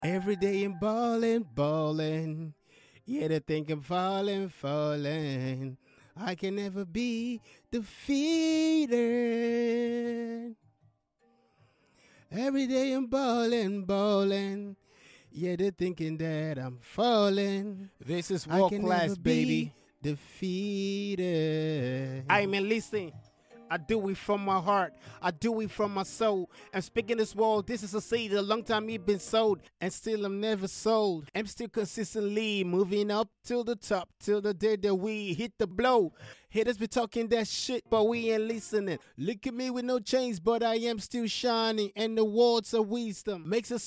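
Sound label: hip hop rap sing, 156, D major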